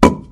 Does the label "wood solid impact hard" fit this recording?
Yes